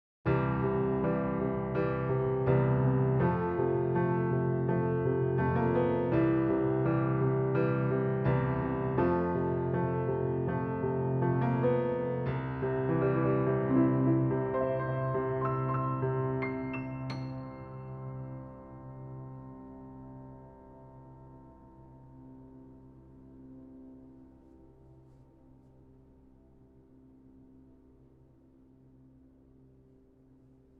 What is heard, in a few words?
Piano Init Loud